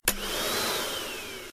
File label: vacuum; Phineas And Ferb - Backyard Defense